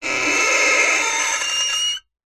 musical violin bowed scrape badly source for horror sound design